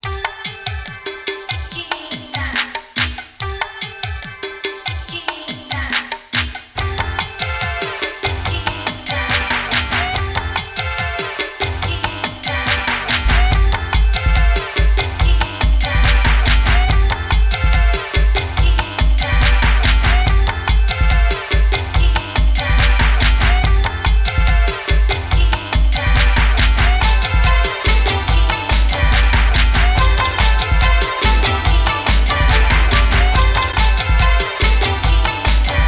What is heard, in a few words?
plaza, Vaporwave; Vaporwave Library Project